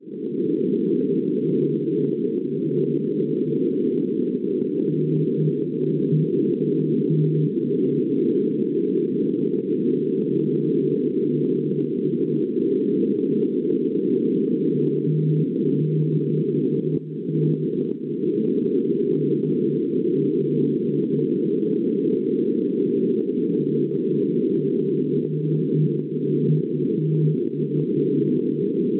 NDB, 369 kHz; NL; Goteborg; Svezia; Schwarzsee

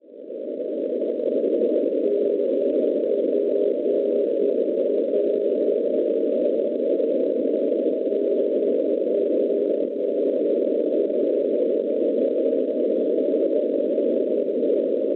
NDB Tomaszow Makowiecki Polonia 488 kHz; NPR; Schwarzsee

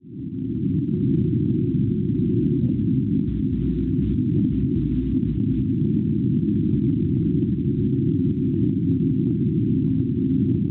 NDB 321 kHz; VO; Vojens Danimarca; Schwarzsee

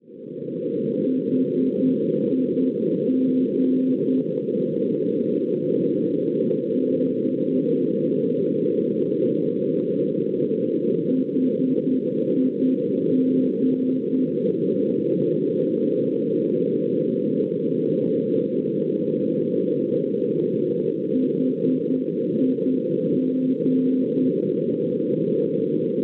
NDB Brema Germania 318 kHz; HIG; Schwarzsee

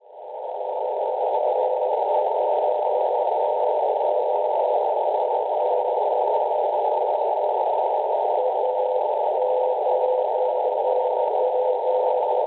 NDB 404 kHz; AGO; Angouleme; Francia; Schwarzsee

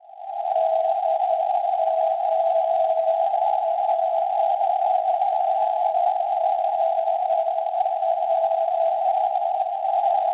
NDB 334 kHz; DX; Dax; Francia; Schwarzsee

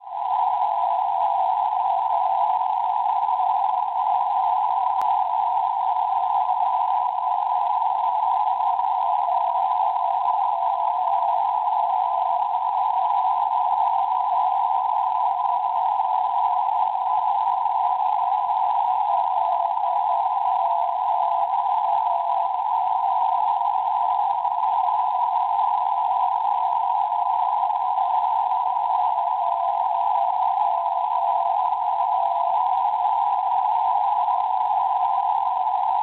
NDB 311 khz; LMA; Lima; Germany; Schwartzsee; Perseus

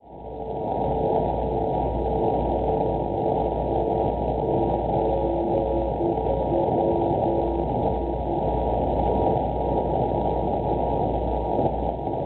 NDB Monaco Germania 358 kHz; MSE; Schwarzsee

